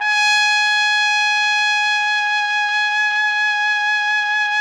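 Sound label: trumpet; Roland - LA Composer vol. 3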